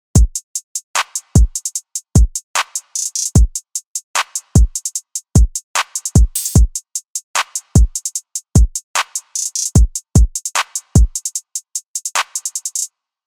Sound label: Full Drum